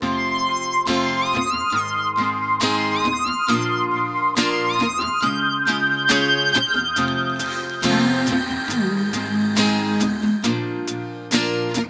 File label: folk